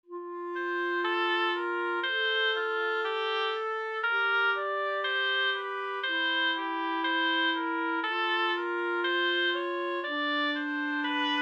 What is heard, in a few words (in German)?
Zweistimmig Dritte Gattung